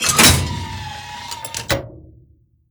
Shooter Trap Lever

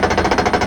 Tram Car Lever loop

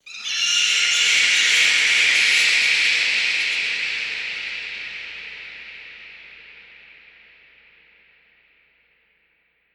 Bats

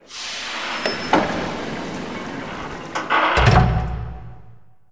Rotate Clock; Vog

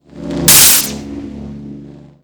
Lightning Flash; moul

